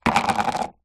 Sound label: food candy bubblegum tape plastic tub set down